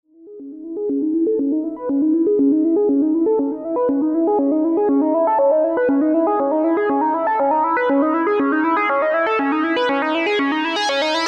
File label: SH-2 Arp